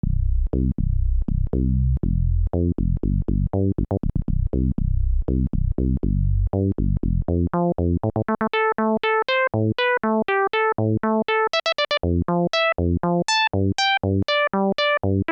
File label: clavia nord modular kb filter demo lpf24 lpf24